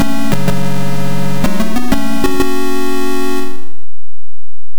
Game Over; Rick Dangerous 2. Game Over; CNGSoft

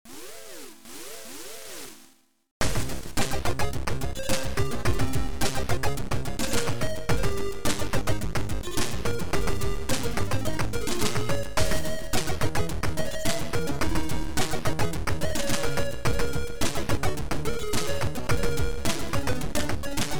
Bossfight; Neoplasma 2: Bossfight; n1k-o; MTC

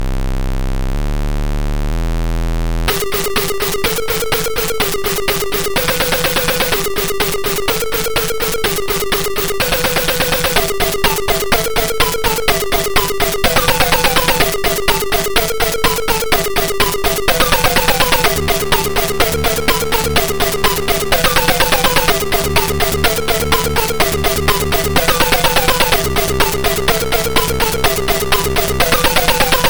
Game Boss; Chibi Akumas; Keith S

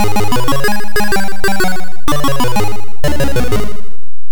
Game Over; Renegade. Game Over; CNGSoft

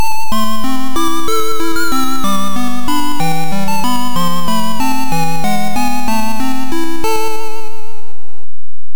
Game Over Spectrum 128; Black Lamp. Game Over (Spectrum 128); CNGSoft

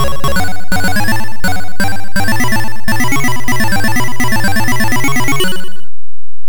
Happy End; Renegade; CNGSoft

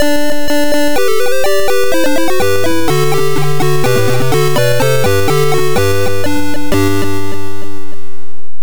Game Over; Black Lamp. Game Over (ST); CNGSoft

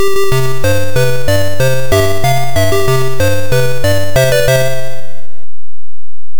Game Over; Arkanoid. Game Over; CNGSoft

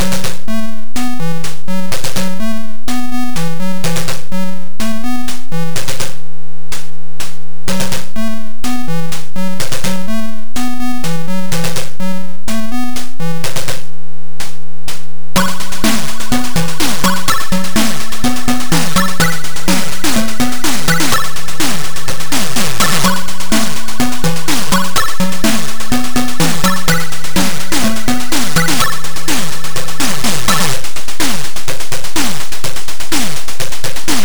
Game Theme; RoboCop. Game Theme; CNGSoft

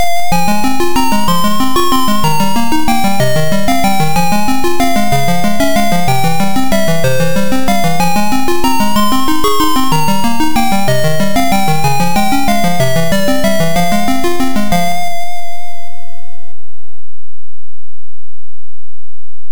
Success; Black Lamp. Success (C64); CNGSoft

